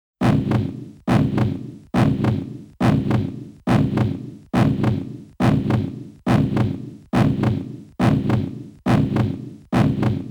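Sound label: Heartbeat, game music; Samurai Shodown; Samurai Spirits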